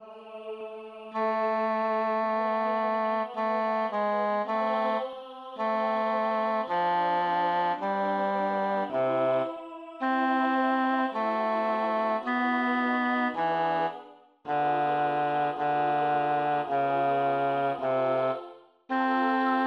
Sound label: Kyrie, Rossini, midi